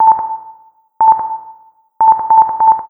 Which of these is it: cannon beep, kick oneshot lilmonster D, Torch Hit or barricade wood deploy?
cannon beep